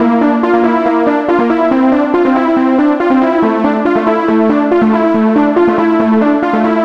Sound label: NRG Pad